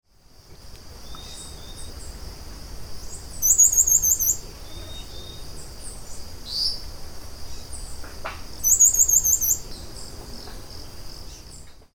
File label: Glaucis hirsutus Balança rabo de bico torto